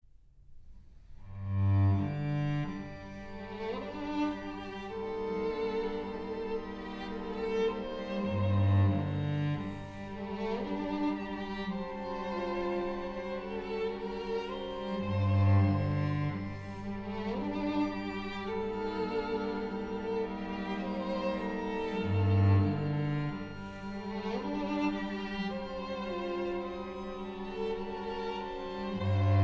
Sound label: Quintet for Tuba and Strings, Adagio